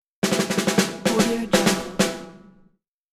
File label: snare drum accent march